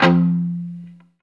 GTR Chunk, Funk; 90's Sample CDs; Club-50 - Foundations Roland; Funk & Mute

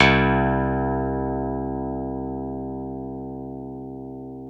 PNO, Electrics, Grands

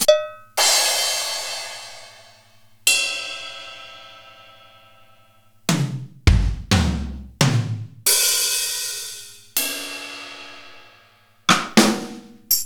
Zoom RT-234 Kit

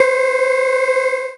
Synths C; Vengeance - Essential Clubsounds Vol.2

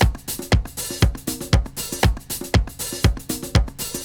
BRASILIAN

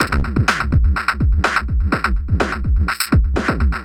Drums Strange

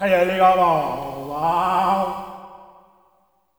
male cry, Africa; Spectrasonics; Heart of Africa 2; S.MALEAMB

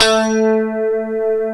ROCK STRAT, Vintage Combo; E-MU Formula 4000 Series Vol. 3; Analog Odyssey

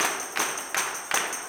160 TAMB BRK, blues soul; Spectrasonics; Vocal Planet

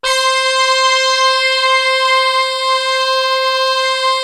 BRASS KIN, MORPHEUS; Syntec; Wall of Sounds

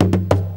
GUIT M KNOCK; Best Service; World Colours

